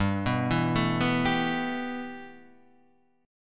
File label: G 6th Add 9th, Guitar, Open D